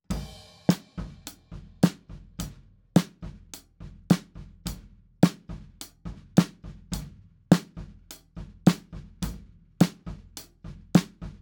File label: snare top; logic